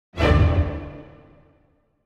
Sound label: Sting, orchestral Stings